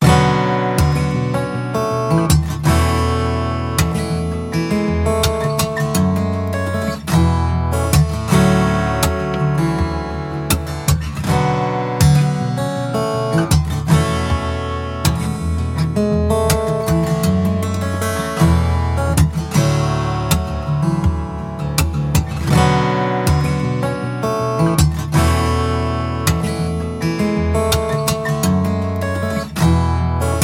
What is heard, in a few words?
Emotional Acoustic Loop; MIUI12